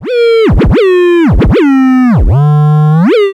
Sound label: fx scratchy, Noise